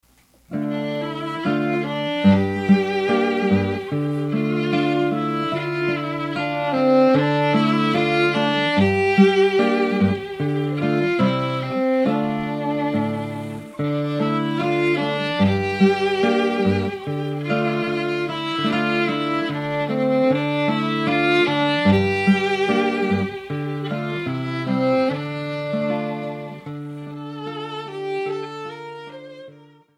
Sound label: Gtr Vln